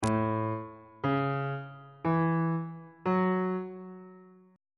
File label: a major 6th chord intervals